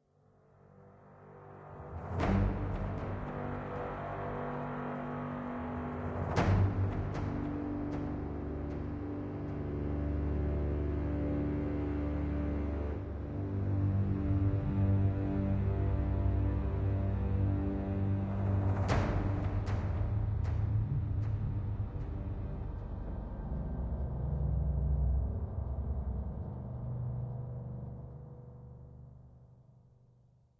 player turn spooky; devils demons android game music

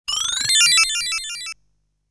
Fan-shaped bullet sound

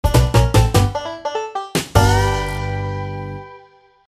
Win; SpongeBob SquarePants Pest of the West Showdown

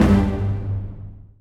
Combo Win Hit; Duel

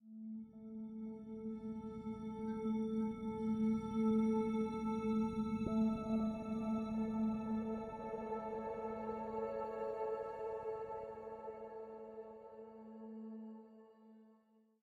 85bpm; Legends of Pegasus; windows gamerip 2012

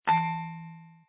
Menu dong

